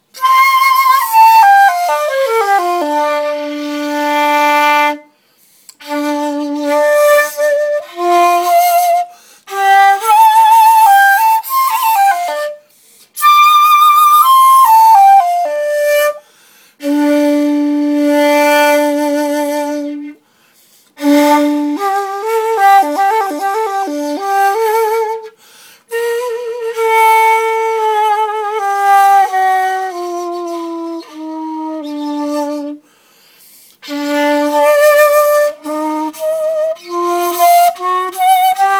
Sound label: flutes, shakuhachi